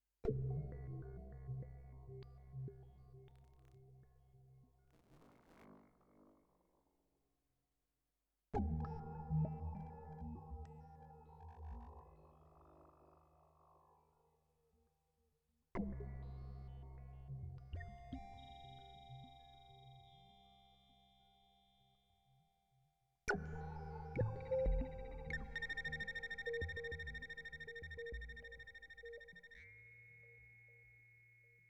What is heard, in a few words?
Sub Mariner Timbres